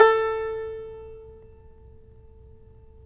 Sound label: tar; Roland - LA Composer vol. 3